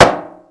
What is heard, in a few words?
metal; Mafie 1